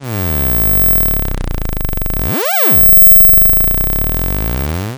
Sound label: Saw Wave Spike